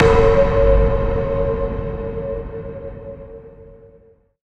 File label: siren